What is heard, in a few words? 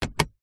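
vehicles car plastic storage compartment lid close interior toyota rav4; zapsplat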